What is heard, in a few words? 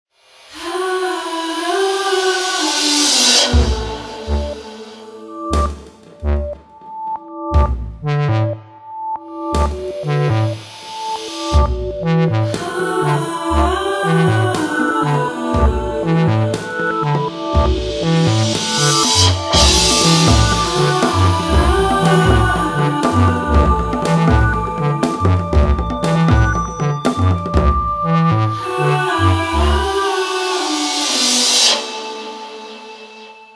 Wobbles, Nokia Tones